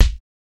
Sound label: steel kick drum sample g sharp key